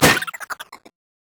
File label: jack in the box; jester